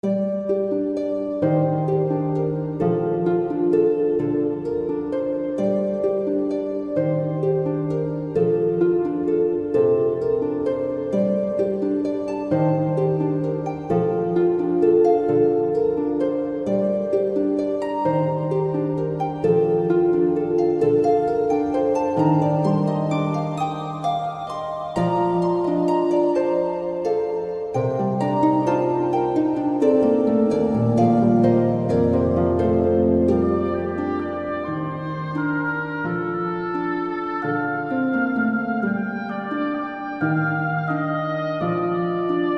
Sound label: High Halls; pokemon demo